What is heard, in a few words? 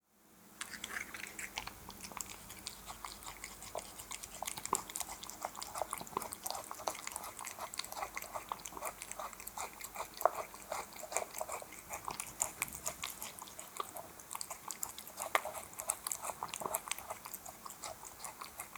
Cat Eating Wet Food; افکت صدا حیوانات; Animals